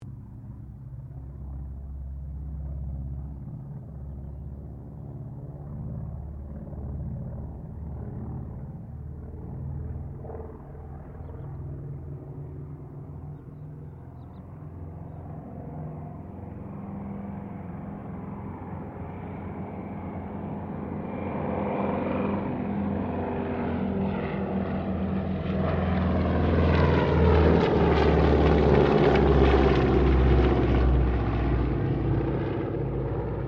AIRCRAFT CERES WINJEEL WIRRAWAY PASS; Mixed aircraft squadrons; Airplanes (Sound Effects:Vehicles)

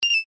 UI Confirm, User interface feedback sounds; Computers (Sound Effects:Electronics); SoundEffects-Foundation4

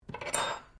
Cutlery general pick up, Household cutlery; Kitchen

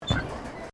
Footsteps Boots Suspension Bridge Wood Wire Step; Footstep Foley; Footsteps (Sound Effects:Human)

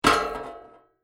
Metal Impact Drop Metal Pipe On Metal; Large metal pipe impact with metal. Great in sound design to create; Metal Sound Effects

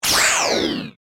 Phaser Gun Stun Ray, Scifi, Science, Fiction; Battle Sound Effects; BlastWave

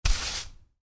Sweep, Soft Brush; Miscellaneous Household Sound Effects; Miscellaneous Household (Sound Effects:Household); Stuart Duffield

